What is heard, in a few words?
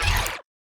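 enemy Jumble Guts Waning Damage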